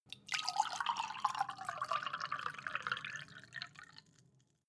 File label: glass pour soft; soundpool